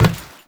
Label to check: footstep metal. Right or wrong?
Right